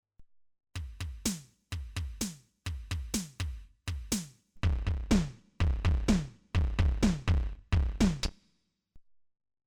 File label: roland beat, freqbox moogerfooger; Batteria cassa/tom Roland Handsonic; VCO: 0 SYNC: SI WAVE:tri ENV AMT: 0 FM AMT: 0 MIX 4; MF-107